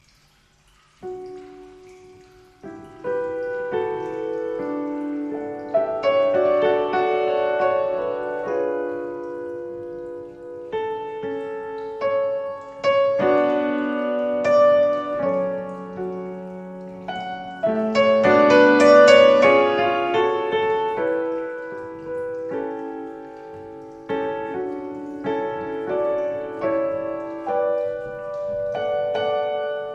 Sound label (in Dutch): piano improvisatie